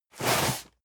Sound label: cardboard box medium slide hard; objekte